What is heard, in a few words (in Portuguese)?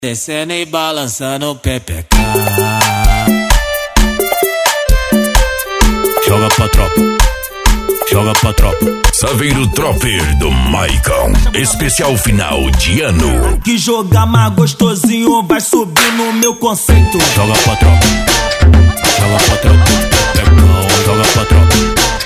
funk agressivo funk